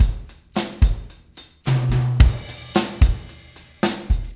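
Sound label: beat fill, Room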